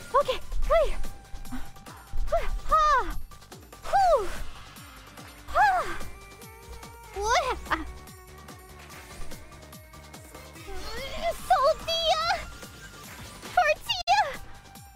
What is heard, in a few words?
noises